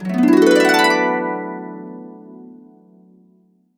harp, -R; Complete Harps; USB Soundscan vol.62; AKAI

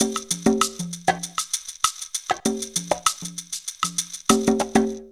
PERC LP, PERC LOOPS; Zero-G; Funky Elements

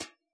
brush slap, Yamaha DJX Rock Kit; 144 Rock Kit

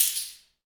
metal jangle, perc fx; Roland LCDP14 Africa VOL-2

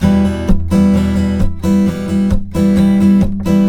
RIFF, Blues Guitar; ILIO; Fretworks

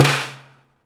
Prepared Drums

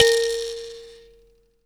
THAMB PIANO, AFRICA

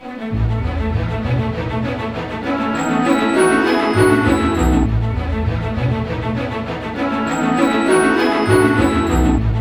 BIKE; East West; Scoring Tools; ORC; Bike Ride

